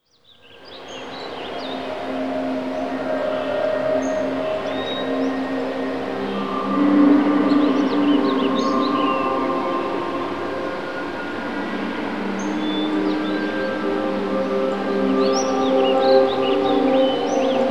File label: Forest and Hills Ambience; Monster Hunter; Area 10